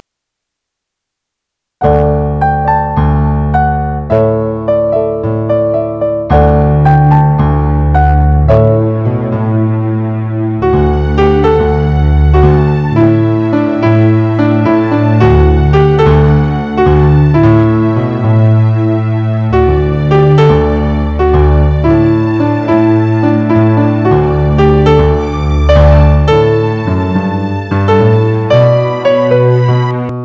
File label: w piano sample